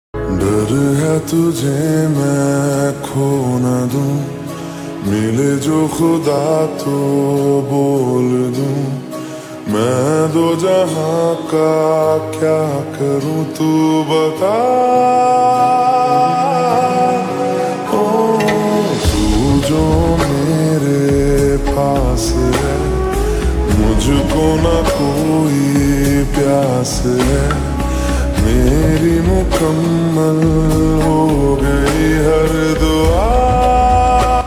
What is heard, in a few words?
Ringtone Slowed Reverb